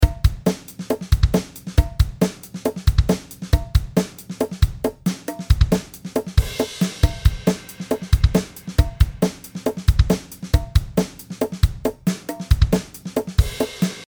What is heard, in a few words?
137 bpm